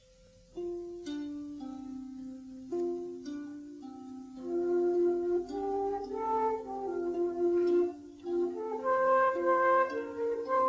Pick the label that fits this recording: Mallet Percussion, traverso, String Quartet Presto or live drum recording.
traverso